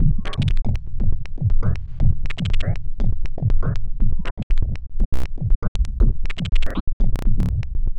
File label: Subtractive Beat